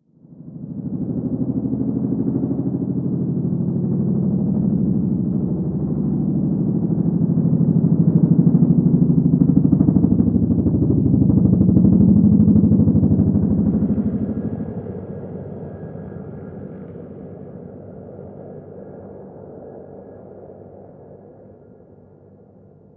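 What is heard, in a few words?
heli flyover interior; Call of Duty Modern Warfare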